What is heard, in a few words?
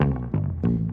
bassey Bass